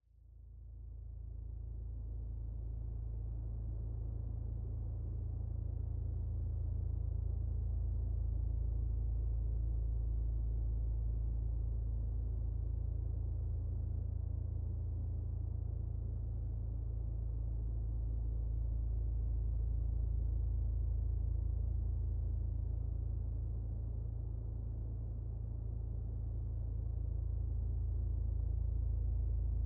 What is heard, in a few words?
amb